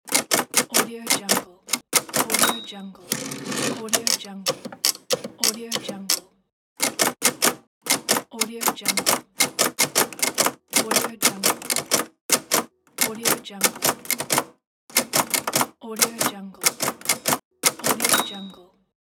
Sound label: typewriter